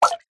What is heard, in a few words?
game sound water drip